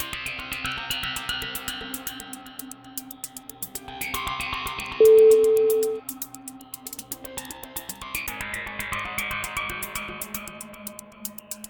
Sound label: tension beat